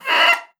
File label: OBOE3; Xsample VOL-6